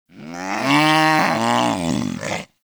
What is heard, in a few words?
Vulpaphyla Vocal Tranquillized; Hunting Critters